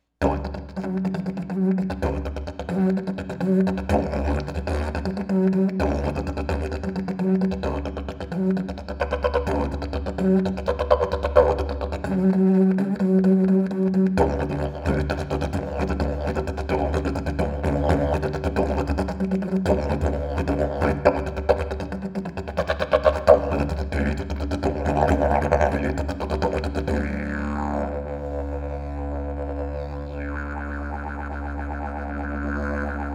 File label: didge